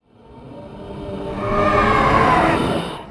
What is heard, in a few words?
mummy